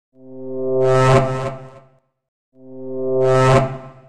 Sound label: Ud Roar